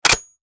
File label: close; tacz; daffas arsenal